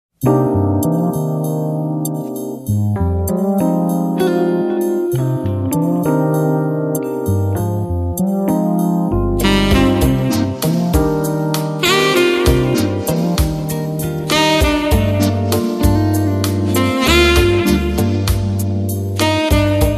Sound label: Jazz